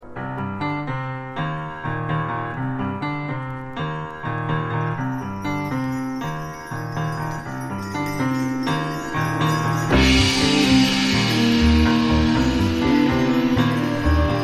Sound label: Middle Eastern Fantasy